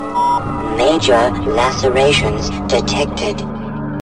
major laceration

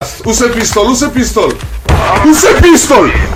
pistol

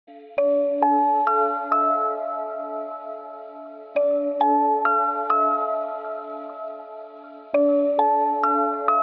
music; silent hill; memefactory

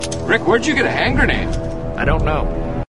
Grenade

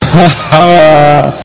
haa

